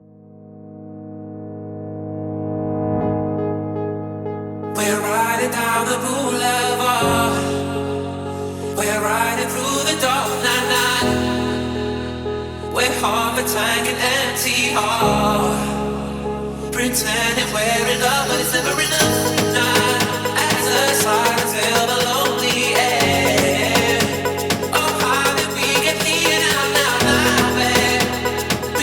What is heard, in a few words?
Afro House Remix